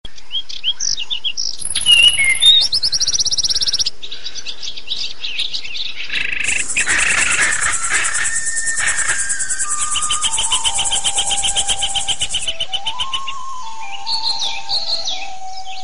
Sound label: sweet birdy sound; animals